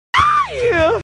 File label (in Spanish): aihh panico, panic crowd